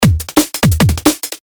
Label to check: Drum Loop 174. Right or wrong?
Right